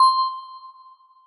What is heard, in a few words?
Bell C7